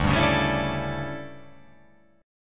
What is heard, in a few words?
D Major 7th Suspended 2nd Suspended 4th D down